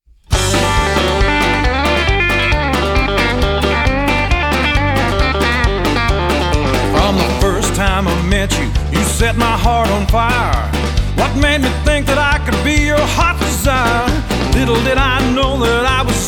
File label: Opto Chickin Pickin